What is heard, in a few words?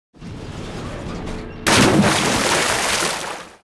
barrel